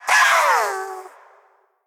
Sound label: Male Beast Death